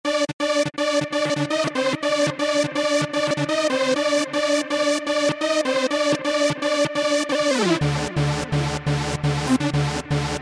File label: Trance Lead; synthesizer; Guess the synthesizer game